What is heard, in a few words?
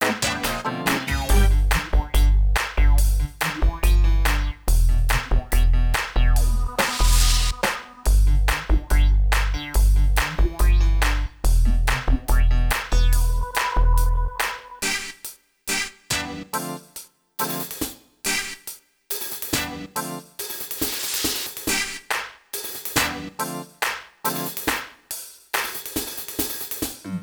Music; quest for the code windows gamerip 2002